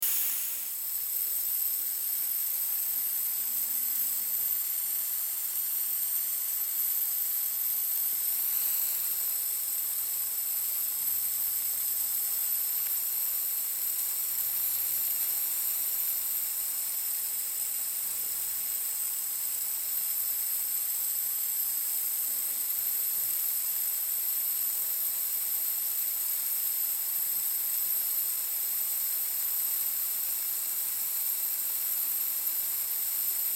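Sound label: skorovarka, pressure cooker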